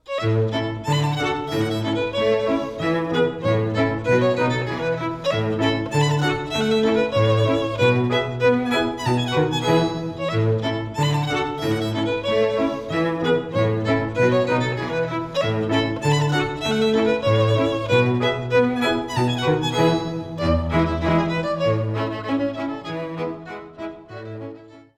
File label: Kentucky Polka